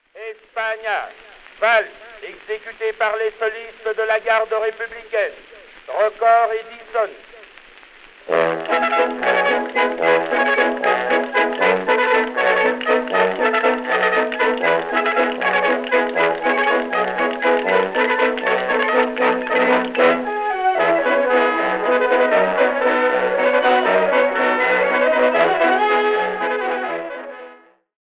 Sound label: waltz; Espana waltz